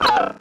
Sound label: damage; Lunker; Mob